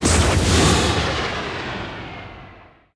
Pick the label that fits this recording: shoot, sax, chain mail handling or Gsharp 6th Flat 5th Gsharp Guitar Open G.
shoot